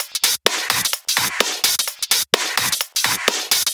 House Loop, 128 BPM; Vengeance Samples

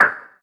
Percussive FX; Vengeance Samples; VEH1 Percussion

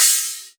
Ride; Electro Flux Sound Kit; ED Ride

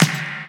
Clap; Vengeance Samples

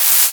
Open Hit Hat; Electro; Percussion; Electro Flux Sound Kit

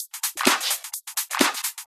House loops 128BPM; Vengeance Samples

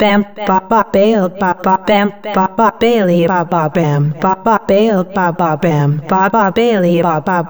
House Loops, Vocal Loops House 128BPM

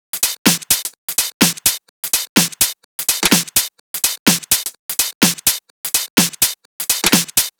126BPM Drums; Vengeance Samples; Tech Freak Kit

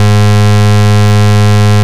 Root G#, Bass; Vengeance Samples

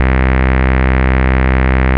Ultimate Bass Root C; Vengeance Samples

